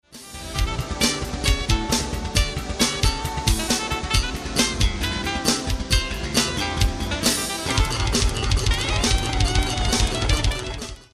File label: bassline; bassplayer